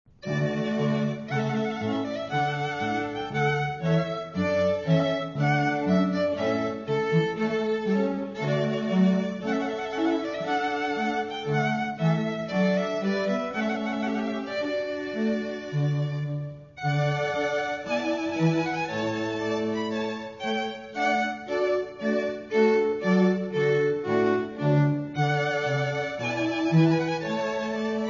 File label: trumpet voluntary, Quartet; purcell